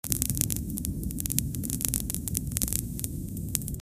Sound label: something miracle appear